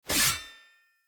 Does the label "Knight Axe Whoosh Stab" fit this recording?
Yes